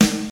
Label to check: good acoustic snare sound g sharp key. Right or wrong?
Right